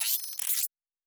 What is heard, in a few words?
Additional Weapon Sounds; Sci-Fi Sounds